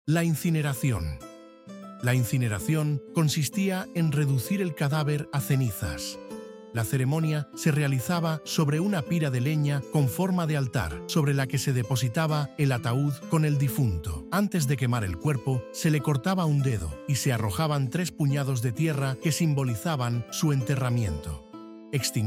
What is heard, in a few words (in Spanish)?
audioguia música; museo arqueologico